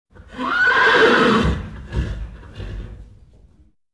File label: neighing horse